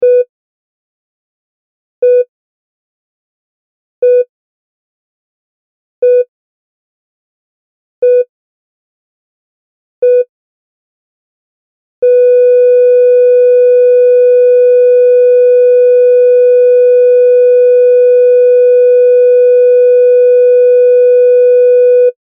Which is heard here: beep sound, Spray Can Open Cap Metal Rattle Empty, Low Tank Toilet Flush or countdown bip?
beep sound